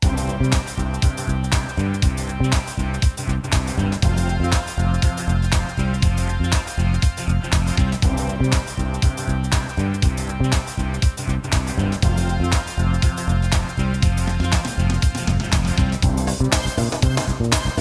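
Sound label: House Garage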